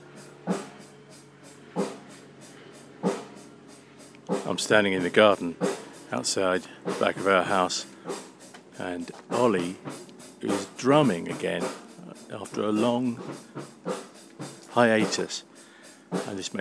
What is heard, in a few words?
drumming